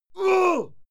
male fight yell; menschen; schreie

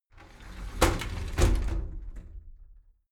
shower slide door inside close regular

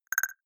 noise wood hits splutter, signale; soundpool

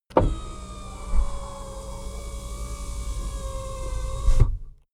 car window

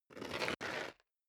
step leather shoes concrete grit scuff

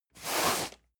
cardboard box medium slide hard; objekte

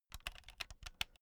insert usb laptop wrong, elektronik; objekte

